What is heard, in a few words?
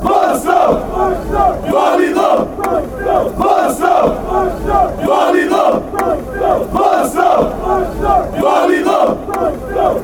bosnia chant